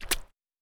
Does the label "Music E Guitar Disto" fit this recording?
No